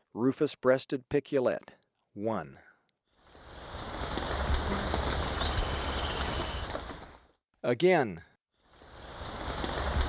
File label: Rufous-breasted Piculet, Birds of Ecuador; S. America